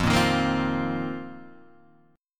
F 7th Suspended 4th F down Guitar Half Step Down

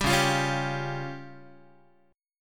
Db Major Flat 5th, Guitar; strummed; JGuitar

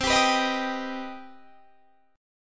C Minor Major 7th C; CmM7; strummed

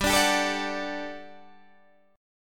G Major 7th Suspended 2nd G, Guitar Standard; strummed

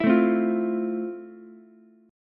F Major 7th F, Ukulele; strummed; JGuitar

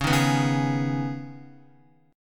Db 7th Suspended 2nd Db, down, Guitar; JGuitar

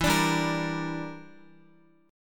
E Minor 7th Flat 5th E, Guitar; Listen to Em7b5 strummed

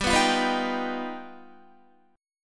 G Augmented 7th, Guitar; G+7 strummed; JGuitar

